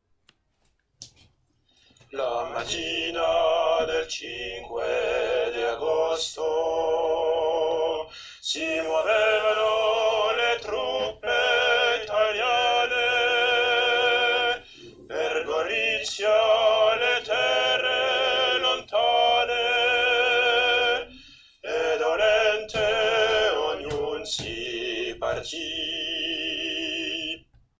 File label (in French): tenor